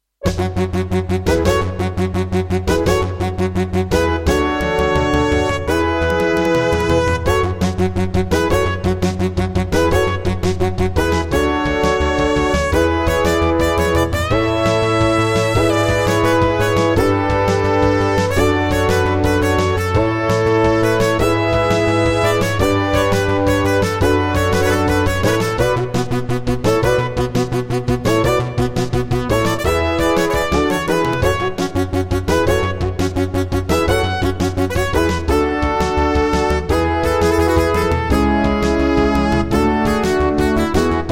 Intro, Roland RA-50; 4D Sports Boxing

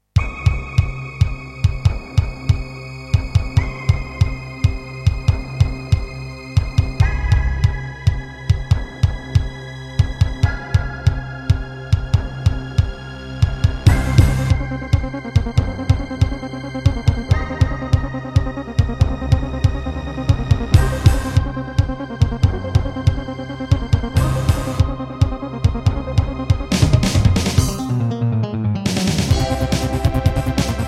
Casio CTK-2100; sigil doom episode 5

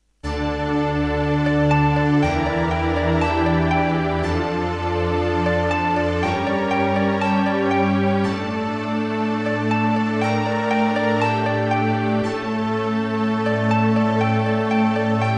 Terratec MiniWaveSystem SOWT-11; Blood

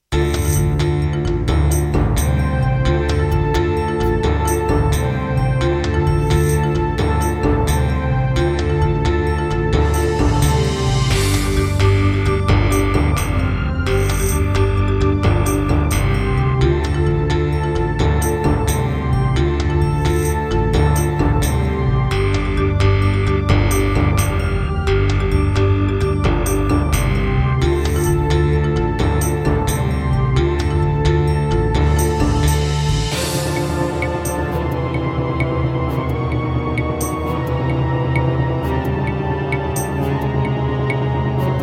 Roland SC-8820 88MAP; Heretic

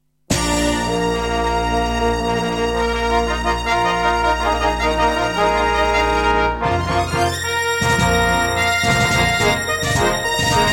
Intro, EMU SoundEngine; Dark Forces

